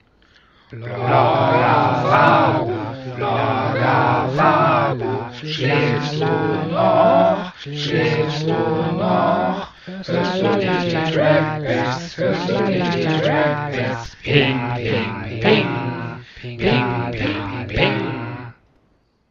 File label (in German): chor